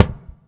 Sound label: Clang, Kicks; Airwindows